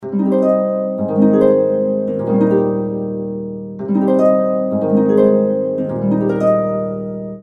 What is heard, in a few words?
text tone